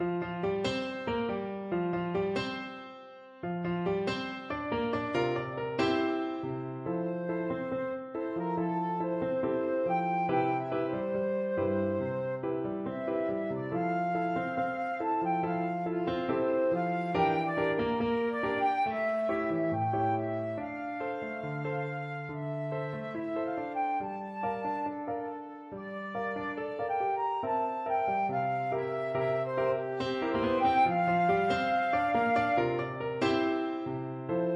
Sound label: bossa, flute